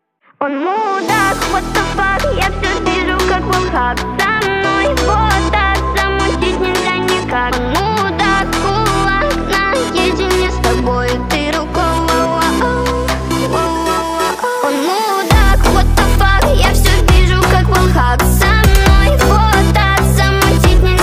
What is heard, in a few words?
phonk remix